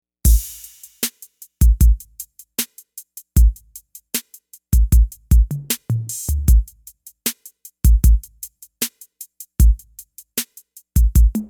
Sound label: analog kit; juno-gi